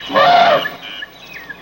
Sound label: ELEPHANT; Greytsounds VOL-1